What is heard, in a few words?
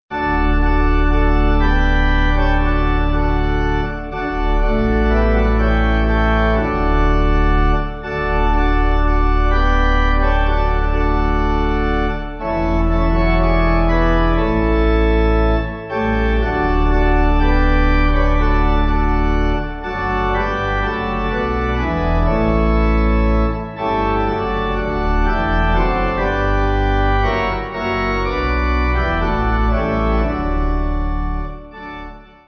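The Whole Wide World, Pipe Organ; Maunder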